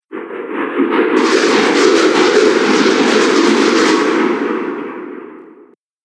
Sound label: Amazing Air Sound, whoosh; Andrew Fleming